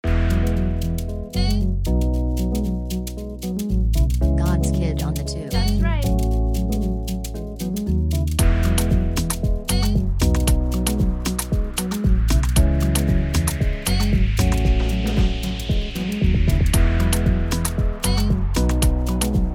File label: Type Beat